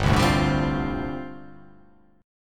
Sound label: G 7th Suspended 2nd Suspended 4th